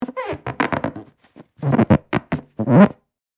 Inflatable toy creaking surface squeak

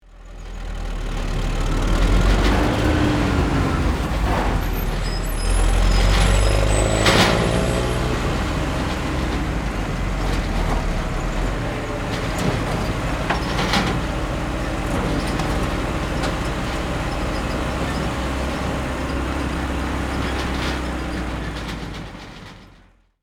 Garbage truck moving back and forth; construction vehicles

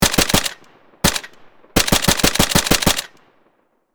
AR10 machine gun firing bursts; guns

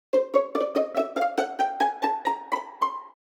Cartoon violin pizzicato ascend

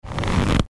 Leather baseball movement creak

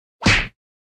Punch medium body hit

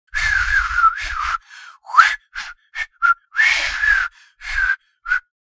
Man trying to whistle

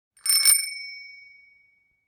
Metal bicycle bell single ring; vehicle

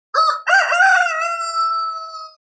Rooster crowing

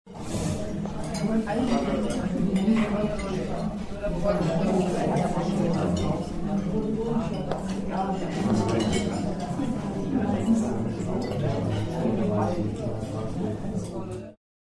Crowd ambience restaurant; Audience and Crowd Sound Effects

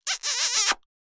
Female kiss with long smack

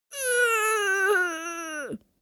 Little boy pain grunt

